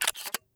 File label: nova insert shell; arccw go